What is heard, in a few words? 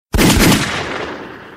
gun sfx